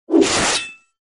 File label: Attack